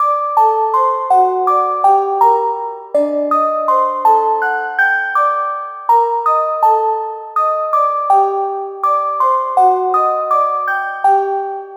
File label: melodic loop carillon Gmin